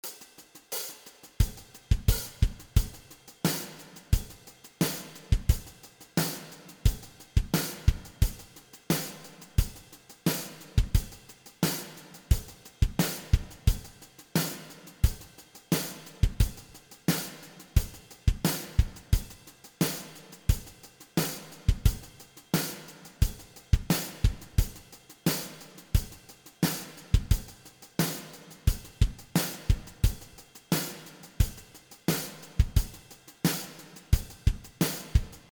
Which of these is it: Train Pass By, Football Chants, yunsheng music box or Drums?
Drums